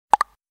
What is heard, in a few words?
hook; jb engine